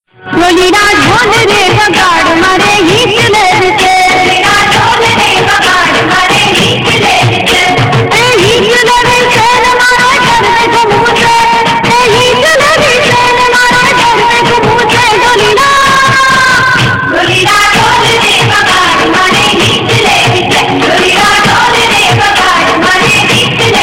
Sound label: Dhol